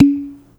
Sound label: air pop